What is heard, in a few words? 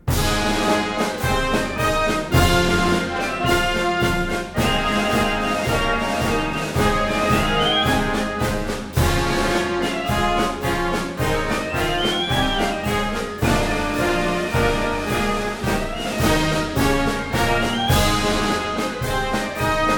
Anchors Aweigh Marines Hymn; marine corps birthdhay ball